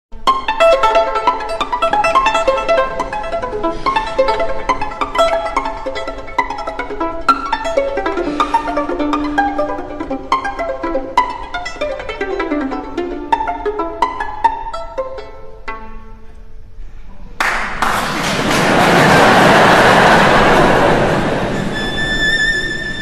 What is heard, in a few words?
viola da gamba